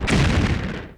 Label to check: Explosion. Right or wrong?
Right